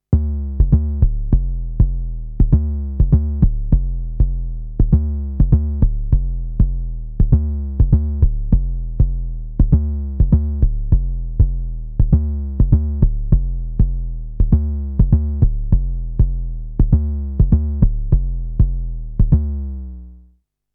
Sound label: ASM Hydrasynth Modern Bass